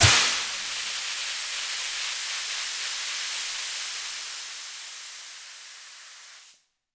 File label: Aircrafts Mi-8MTV2 Air Flow; DCS